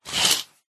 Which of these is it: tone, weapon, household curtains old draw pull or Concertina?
household curtains old draw pull